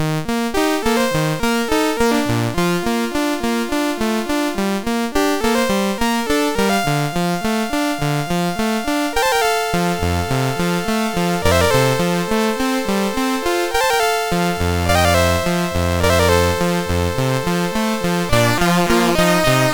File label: MOS6581R4